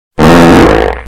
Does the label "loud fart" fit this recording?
Yes